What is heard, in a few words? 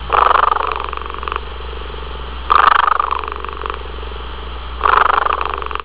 purring